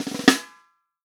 REAL DRUMS FUNK KIT

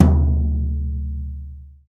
TOM XBRUSH, Brush Kit; Club-50 - Foundations Roland; Brush Kits

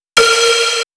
Square Spank; random and out of place

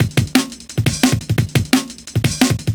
DNB BREAK

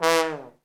THE TROMBONE

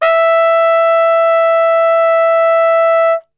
E 2, Brass; Best Service; Brass Super Section

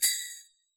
jingle bell, Yamaha DJX Rock Kit

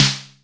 snare classic; snares

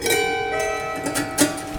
Prepared Piano; Battery 3 Sample Kits

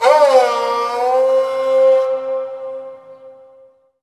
SARANGI, Indian Ensemble

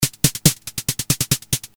Loop Hat, Drums; leloops

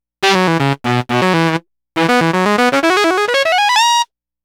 Sax Line; Dr. Fink's Funk Factory

